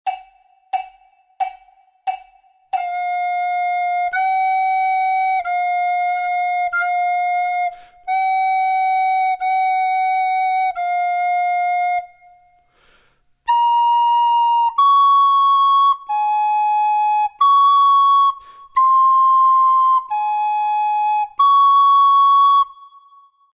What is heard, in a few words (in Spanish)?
DUETO FLAUTA